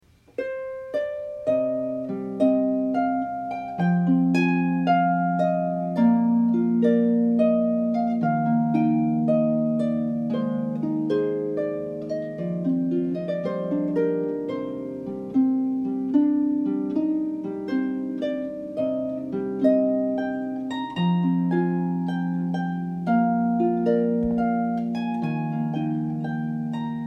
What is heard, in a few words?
tango strings